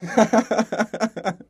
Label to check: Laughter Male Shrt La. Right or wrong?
Right